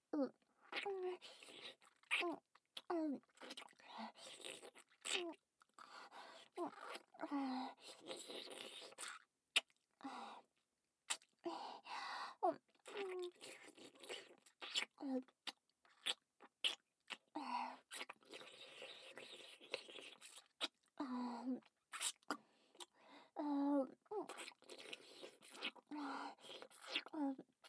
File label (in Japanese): 乳首舐め 左